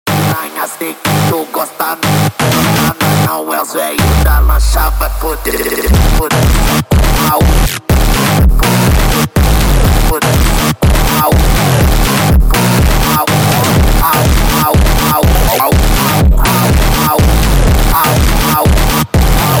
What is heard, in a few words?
sped Up, funk